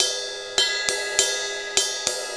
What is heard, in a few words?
Ride Cymbal Pattern